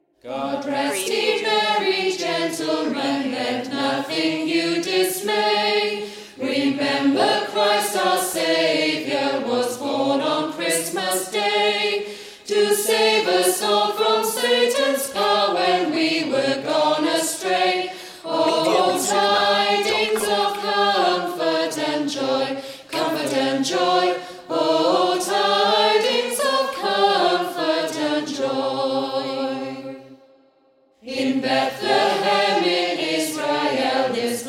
choir; god rest ye merry gentlemen

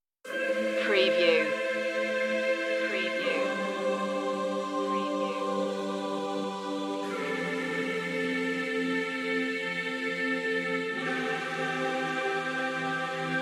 choir only

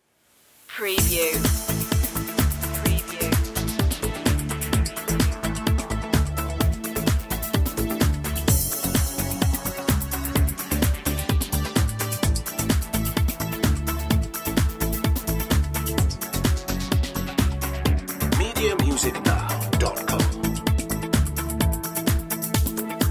positive uplifting

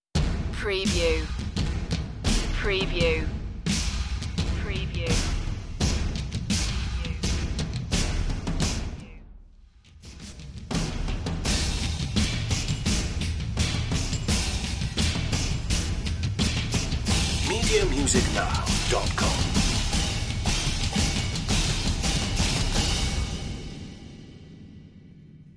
percussion only version

